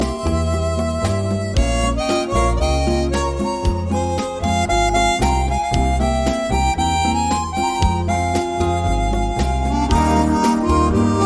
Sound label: evening melody ringtone